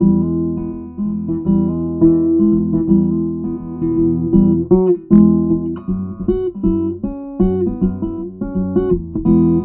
guitar dobro mic

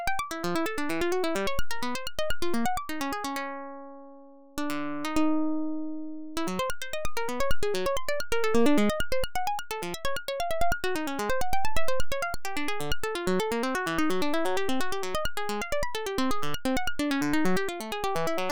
feedback recording

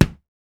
kick; dsp2008 sound library

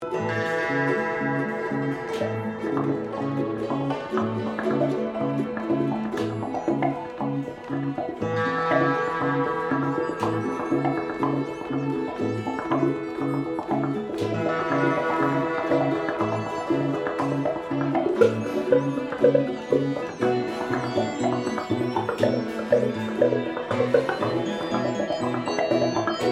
World Strings

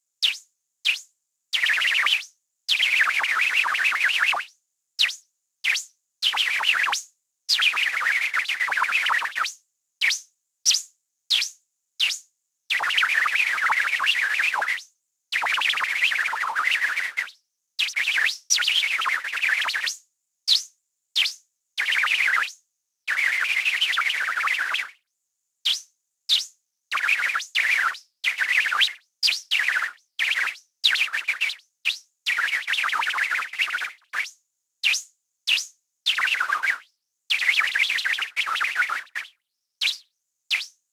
Noise Rythm

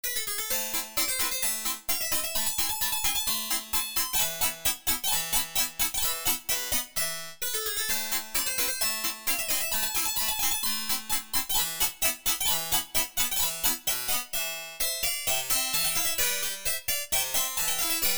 turkish march

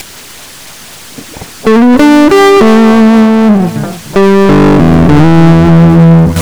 recording fuzz

